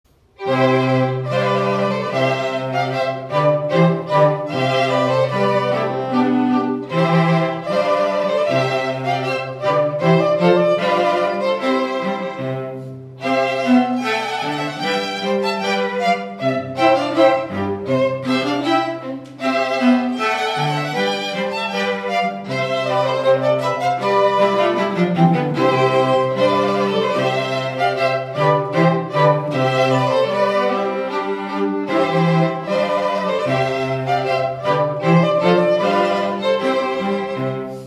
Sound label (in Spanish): Trompeta voluntaria Marcha Príncipe de Dinamarca; MUSICA PARA BODAS; Clarke